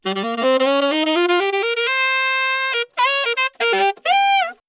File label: Signature Tenor, saxophone